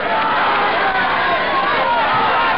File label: AUDIENCE; StixNtrax